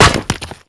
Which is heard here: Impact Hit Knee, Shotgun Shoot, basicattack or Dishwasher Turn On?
basicattack